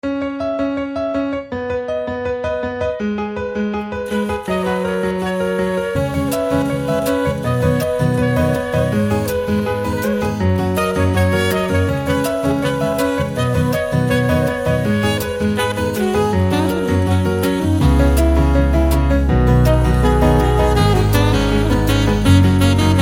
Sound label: Saxophone